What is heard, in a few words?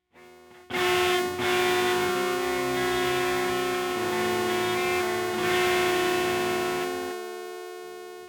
sawtooth; Moogerfooger MF 107S; FREQ. 6